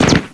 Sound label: fmj hit; cstrike; codlife